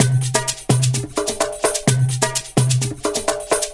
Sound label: Percussive Loops 128BPM; Vengeance Samples